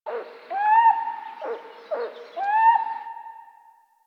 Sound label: Forest Bird Mid; Warframe; Grineer Forest